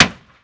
ball hit sign; golf; ProStroke Golf World Tour 2007

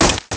glass, bullet impacts; Soldier Of Fortune II Double Helix; XBOX

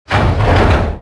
door large start; movers

